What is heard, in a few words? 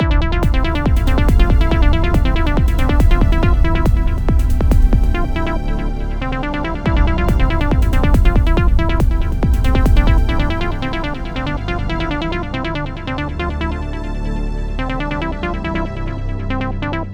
level loop; GPGTBase; gui